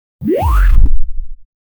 pulo de personagem para, platformer

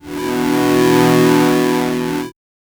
som de pulo em 8 bit

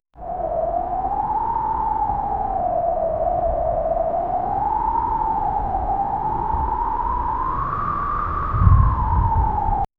som de deserto vento sopr

